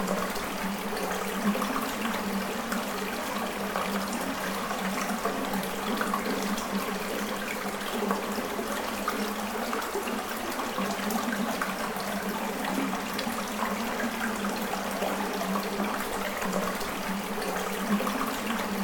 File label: limestone cave